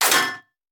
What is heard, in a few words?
Bullet Impact; Sound Ideas; Guns